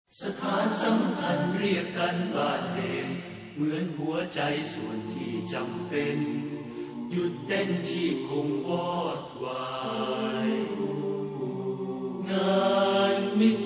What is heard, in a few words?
Acappella